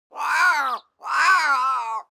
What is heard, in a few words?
animal bird crow single abstract; smartsoundfx